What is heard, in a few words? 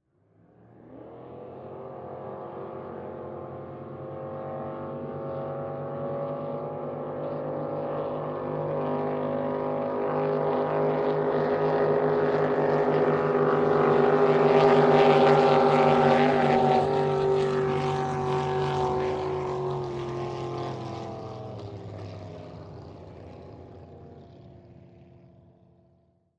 Drag Boat By Cu Race